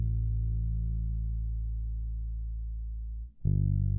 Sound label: bass; musdb18